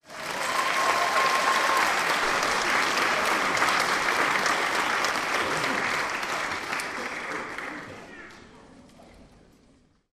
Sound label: human audience theatre applause cheer